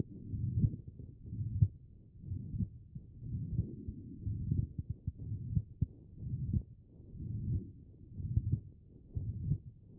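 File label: HEART, heart sound; B MODE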